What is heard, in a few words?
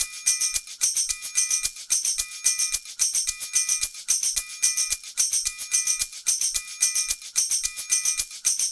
Ensemble, Latin Percussion; Sonic Foundry (Sony Creative Software)